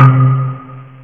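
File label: D50 marimba; jazz jackrabbit 1; M8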